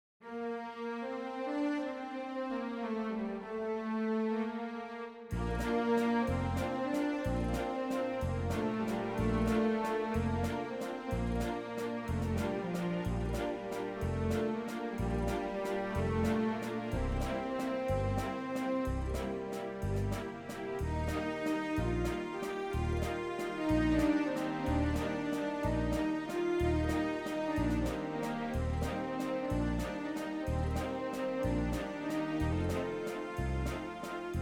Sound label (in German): Walzer